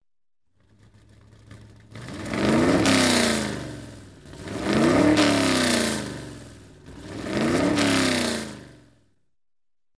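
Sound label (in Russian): перегазовка мотора 3 раза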